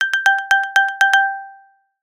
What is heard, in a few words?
ping; iOS Sounds